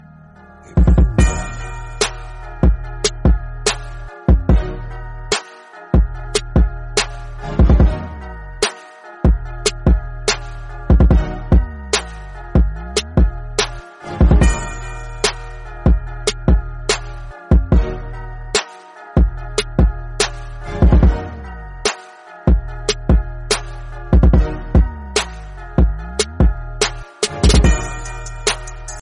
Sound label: iphone remix ringtone